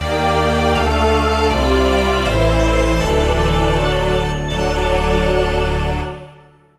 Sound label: Appearance Fanfare; Samus Aran; Metroid Samus Returns